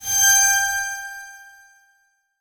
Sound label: Transition Musical Tone Medium; Production Element; Title Transition; AdobeCC